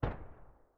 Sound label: ump45 silencer mono far